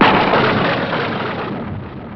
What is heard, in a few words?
thunder, synths; Rubber Chicken Software Sample Bank